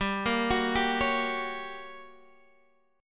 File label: G Minor Major 7th Flat 5th G